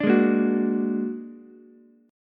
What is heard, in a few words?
A sharp Augmented 7th A sharp Ukulele; A#+7 strummed; JGuitar